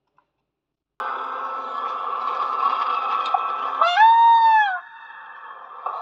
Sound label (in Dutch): geluid pauw